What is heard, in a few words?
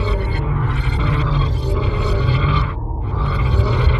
Evoorg Em, Ethereal Textures; Sonic Foundry (Sony Creative Software); Ma Ja Le Ethereal Textures